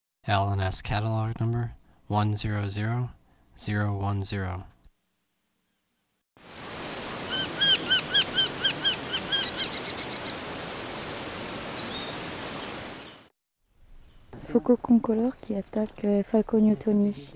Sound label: sooty falcon